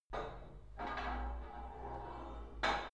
put light weight onto barbell soft reverby, fitnessstudio; sport freizeit